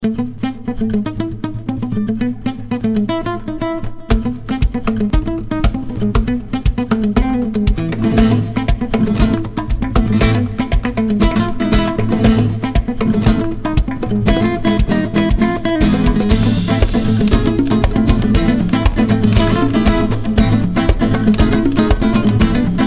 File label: Fast Flamenco; Jane the Virgin; World, Vibey, Intense; Latin